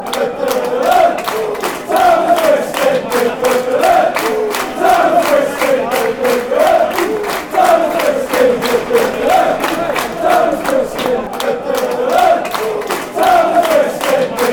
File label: chant; ipswich